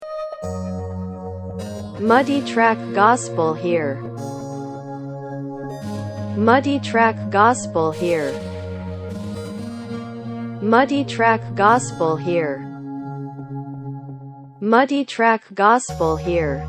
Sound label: PAD